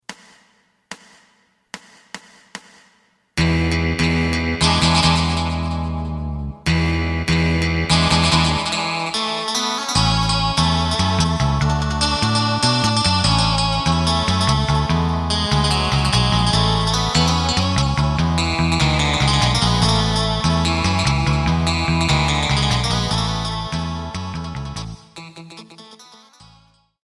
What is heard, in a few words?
drum